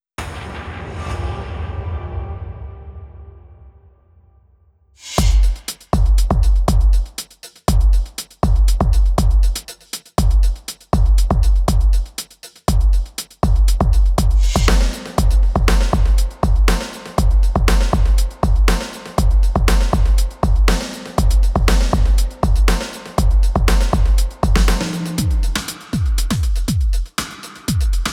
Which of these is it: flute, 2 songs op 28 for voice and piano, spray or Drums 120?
Drums 120